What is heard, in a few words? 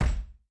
Knock Heavy; blue archive